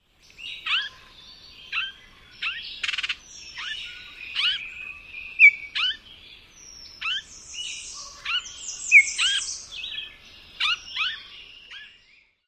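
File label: Baltimore Oriole encounter calls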